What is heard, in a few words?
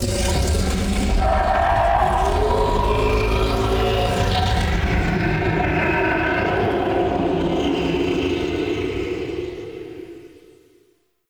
Chasm Scream; Ambience; Warframe; Man In The Wall; Entrati